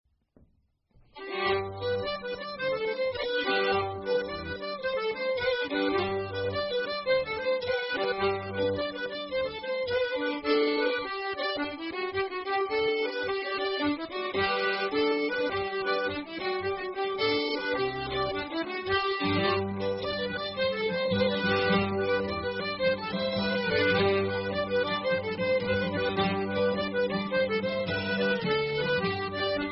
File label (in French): Rondeau sans nom; rencontres-2014